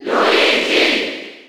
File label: Cheer German; Luigi; SSB4